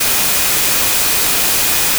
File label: additive cello single; cosine